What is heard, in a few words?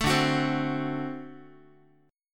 D 7th, down, Guitar; D7 strummed; 12,10,9,10,x,x; tuning 4A,4E,5A,5D,5G#,6C#